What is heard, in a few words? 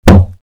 dart board impact